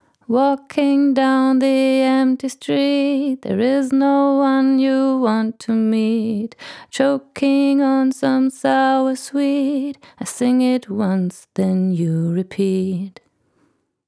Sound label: Female Vocal; Tegeler Audio Vocal Leveler 500